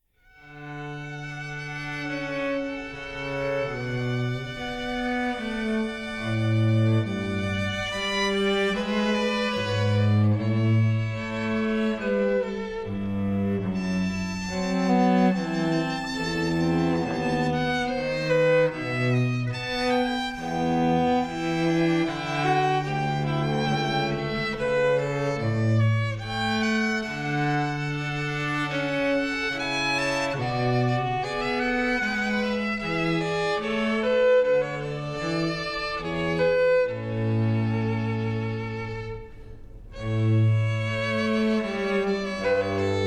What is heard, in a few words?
trio air; bach